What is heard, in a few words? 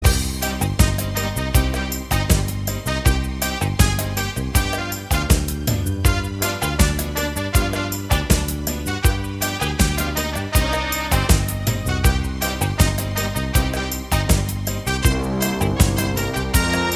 Salsa, Dance